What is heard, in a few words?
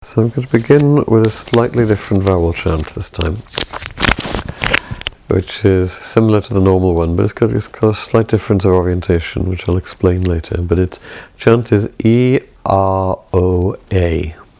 chant; iao chant; angels